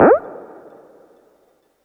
Synth, Techno

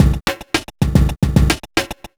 evil beats 110

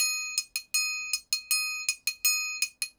triangle 80, latin, 80bpm

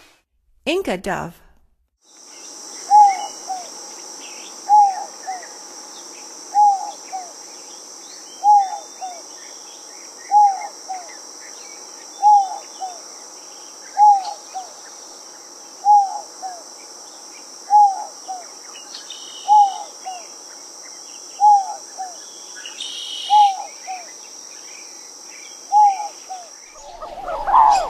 Inca Dove, Songs of Birds of North America; Stokes

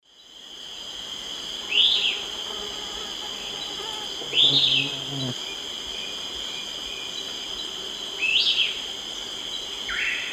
Myiopagis flavivertex, dawn song; S. America; Eastern Lowlands

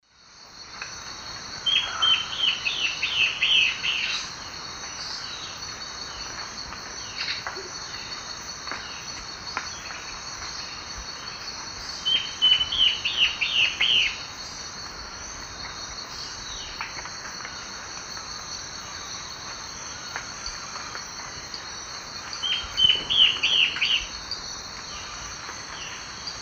Hypocnemis peruvianus, song; S. America; Eastern Lowlands

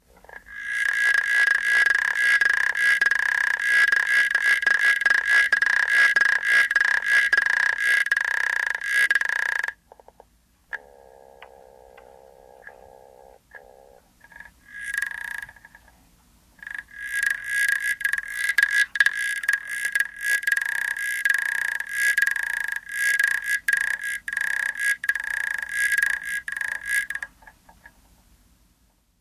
African Clawed Frog; N. America; Songs of Frogs & Toads of North America

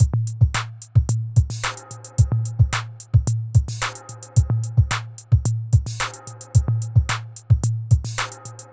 r n b kit 110

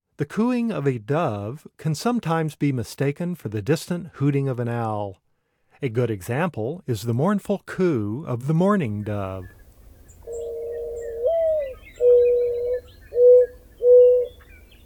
Mourning Dove, Bird Sounds; North America; Common Western Species; Know Your Bird Sounds

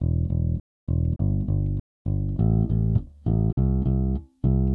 Stingray, Rock Bass; Old Grey Stingray

